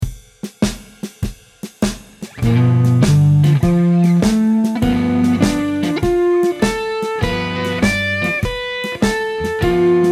Bm7b5 Arp hoch

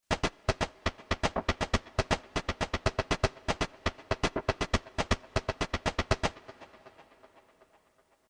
ARP 1/16 Reset 1 Repeat 1 Bit Sticks; Elysion 2

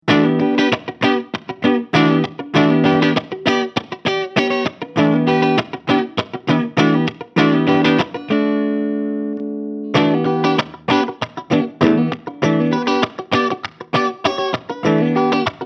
V Black Satin Clean

